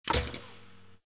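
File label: bullet light ballistic titan ricochet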